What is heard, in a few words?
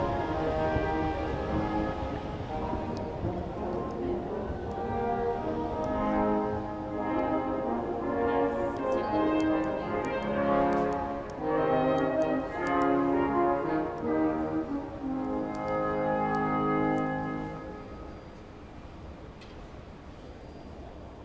monday morning brass quartet at work